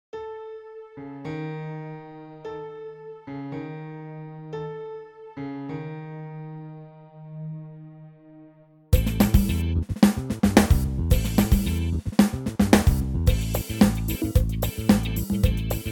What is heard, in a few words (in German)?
Tubula Bells; AbendFunk; Audacity